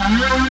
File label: RAVE, RAVESYN; Best Service; Dance Mega Jungle Rave